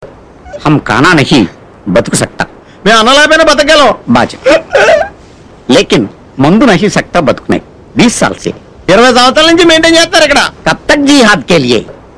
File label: Telugu